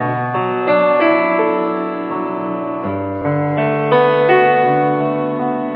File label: piano, Loops Sad